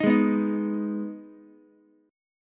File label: D sharp Suspended 4th Sharp 5th, Ukulele; strummed; JGuitar